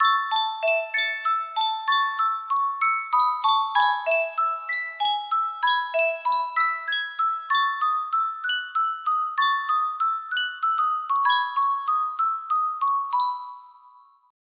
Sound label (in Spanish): Yunsheng caja de musica, music box melody